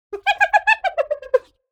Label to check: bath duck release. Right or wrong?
Wrong